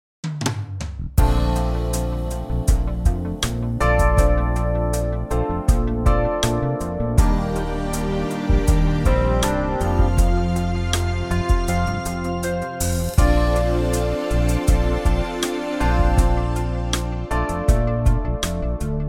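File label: Bb, Backing Tracks for Male Vocals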